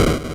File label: various, explosions